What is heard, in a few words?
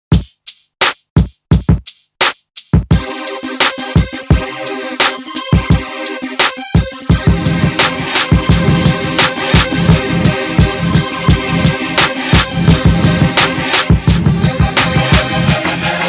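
Alesis Midiverb 2 Reverse Regen 2 sec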